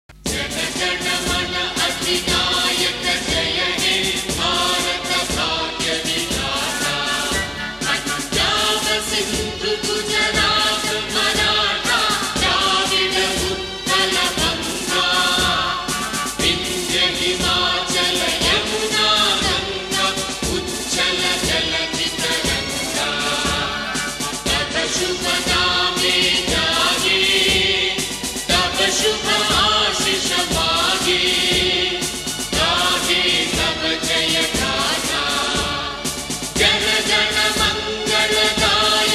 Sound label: National Anthem, Patriotic; Jana Gana Mana